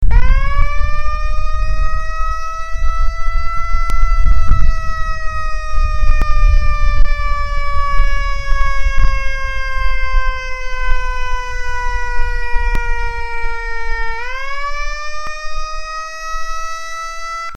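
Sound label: Simulated Mechanical, siren; whelen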